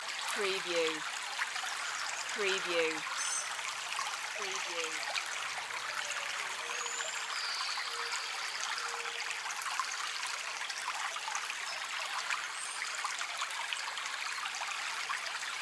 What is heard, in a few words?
Birds by the Stream Loop